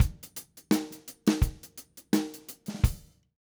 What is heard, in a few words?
Reggae Stoptime, Drumset; Reggae Return Tag; Sonic Foundry (Sony Creative Software); Joe Vitale Latin Percussion